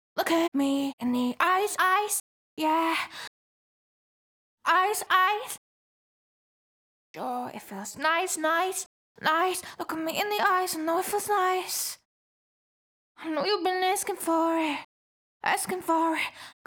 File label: CLEAN DUP L (EMaj) BPM145, Pop Vocals, Acapellas; Ghosthack; Ultimate Pop Vocals